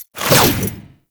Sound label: Ninja Stab Attack Action, Foley; Tau Dax